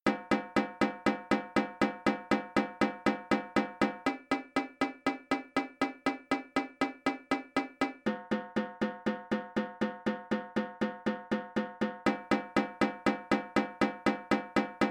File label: Tenor Crossover; (Intermediate) Tenor Crossover Asymmetry; Drumline Warmups